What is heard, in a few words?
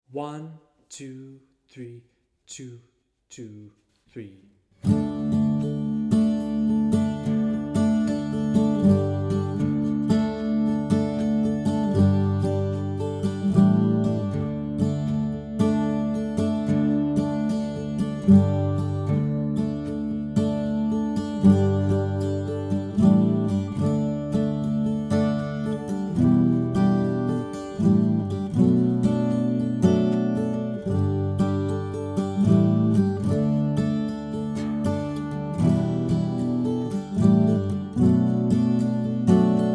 The Whinny Hills Of Leitrim, Guitar Steady, Slipjigs Play along backing tracks